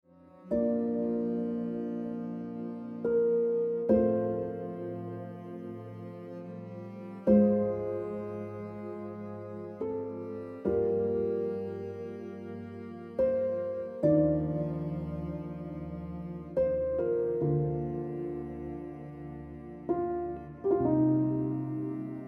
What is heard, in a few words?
Jade Ringtone Piano Music